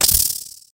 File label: spider hurt; mobs; mobs mc